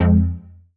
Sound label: stab palce, stabs